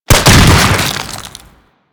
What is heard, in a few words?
Hive Explosion; Ambience; Warframe; Corpus Infested